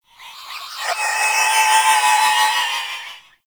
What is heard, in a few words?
Sticky Ink Vocal Call; Shadowgrapher; Mutator